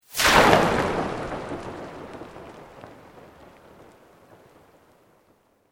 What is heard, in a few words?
Sweep Organic Medium